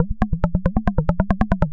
Noise, LFO & Noise; Sonic Foundry (Sony Creative Software); Prototechno

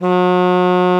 F#3, alto sax; Xsample VOL-2

